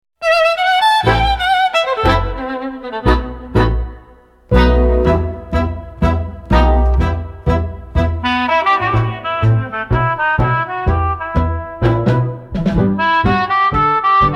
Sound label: Tango Instrumental